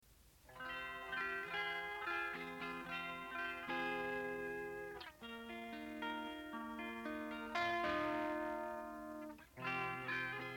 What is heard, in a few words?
guitar intro